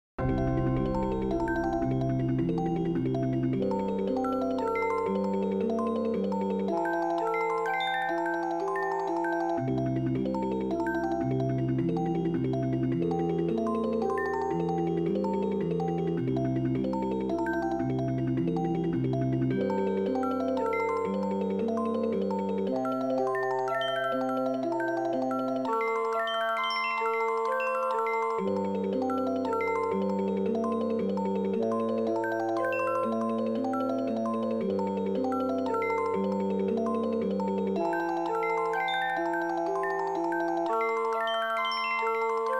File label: slendro with detuned octaves, fractal music